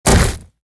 body impact ground

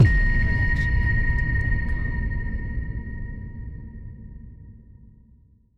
cinematic hit glass explosion; soundscrate

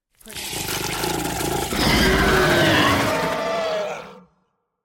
monster roar; soundscrate

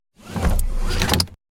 seatbelt do up

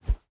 Fighting Woosh; SoundsCrate

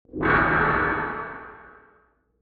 retro sword swipe; soundscrate

